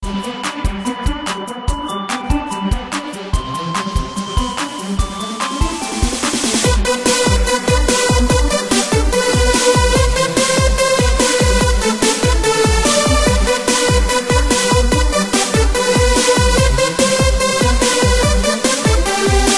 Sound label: jingle bells dubstep